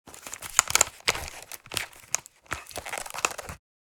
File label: Headphones handling putting on; headphones and speakers